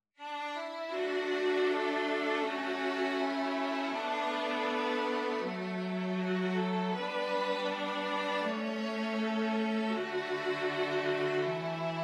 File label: STRING TRIO bass; The Lord of the Rings The Fellowship of the Ring